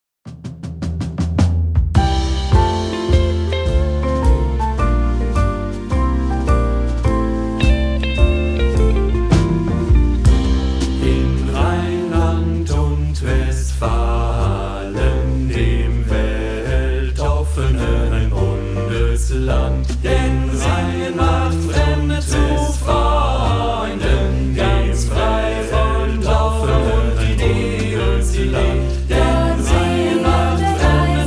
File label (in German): Sopran, Gemischter Chor; eine stimme nrw